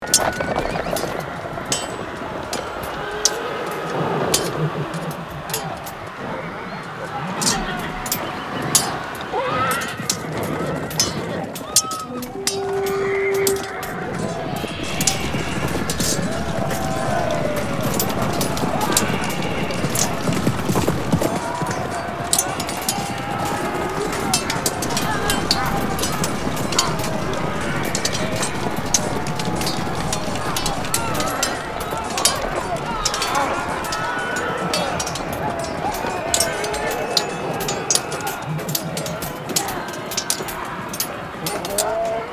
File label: Medieval combat ambience sword battle and screams